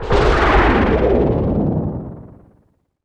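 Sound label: deep house FX, 125 bpm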